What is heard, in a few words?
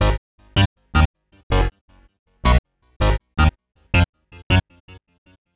Bass, Futurist Drum & Bass